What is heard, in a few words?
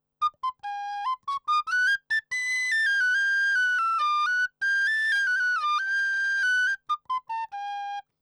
tin whistle SM58; audiotechnica at2010